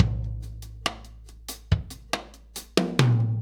Earth Loop, Drum Tools, Laid Back

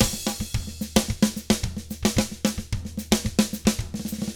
SMBA, 110 SAMBA, Drums

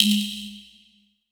DSP Hit

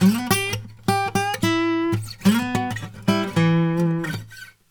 Blues Guitar; ILIO; Fretworks; LICK

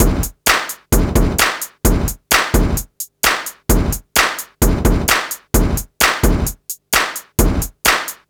rock; INIS; Funky Xtreams

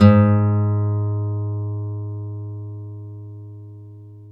NYLON F G#1, GUITARS; Synclavier Sampler Library

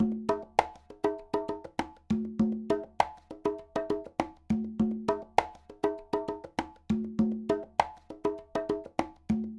Conga, Latin Percussion; Sonic Foundry (Sony Creative Software); Joe Vitale Latin Percussion

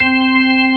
full slow C4, ToneWheel Organ; E-MU Formula 4000 Series Vol. 3; Analog Odyssey

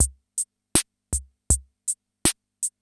CR78; Best Service; XX Large Most Wanted

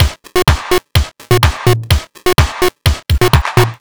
Drums, 126bpm, Nu Dance Electro; Zero-G; Soundclash; Combi Loops; Dubnotronic

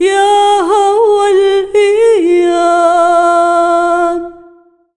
SINGER, ArabianTraditions; USB Soundscan vol.43; AKAI

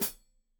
Hat Foot, Hi-Hat, Cymbals Stereo

